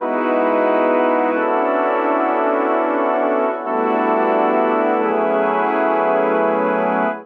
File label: Progression Afloat I-v-bIII-ii E Major 132 bpm, Brass Quartet; MIDI Clips; Ableton; Spitfire Audio